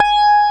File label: Hrm Flan G#4, GUIT2 AC; Best Service Gigapack 2